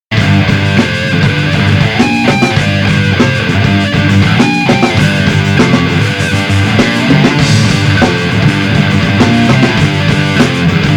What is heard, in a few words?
Lost Riff; Unreleased lost sessions